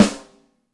Brass55, Yamaha DTXpress, Acoustic Snare

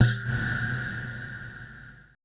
AMBI FX

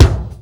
KIK; Greytsounds VOL-1; SDX KICKS

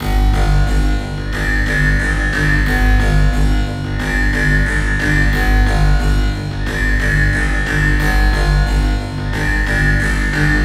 Robo Beat, Percussion

